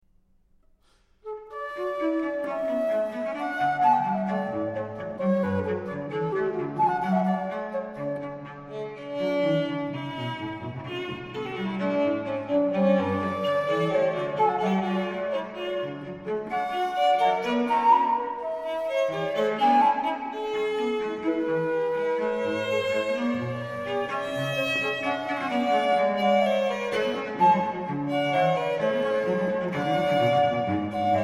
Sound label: Trio IV Allegro